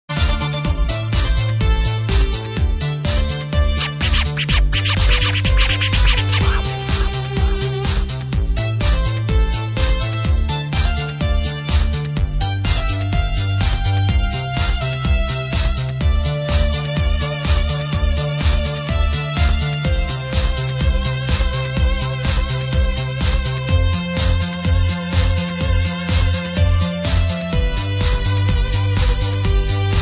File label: Electro Remix; Thrust